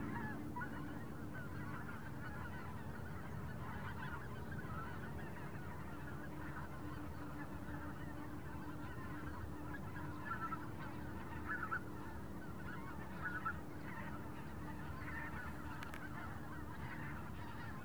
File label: Wendland Autumn Open Field Big Goose Swarm Passing Overhead Light Wind; ShapingWaves